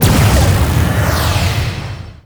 Powerplant Final Explosion; Gameplay; Warframe; Corpus Railjack; Tether Power Plant; Ambience

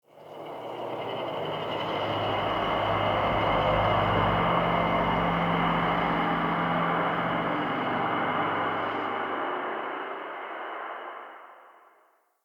Crypt Cymbal Swell; Ambience; Champion Crypt

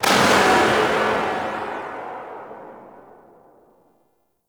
Gorgon Tail; Warframe; Void Trader

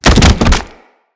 Infested Runner Bodyfall; Runners; Foley

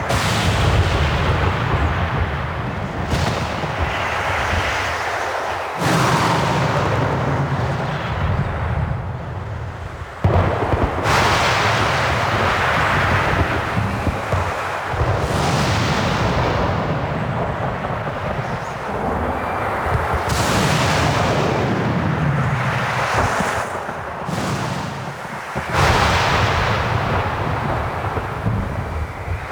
Weather Angry Loop; Ambience; Gameplay; Warframe; Duviri

